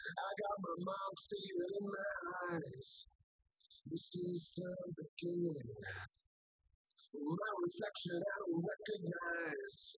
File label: wdrums liverec; SiSEC10; underdetermined